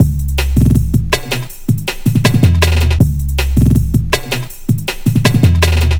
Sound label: 160 send off, Drum Loops; Drum & Bass; Total Drum&Bass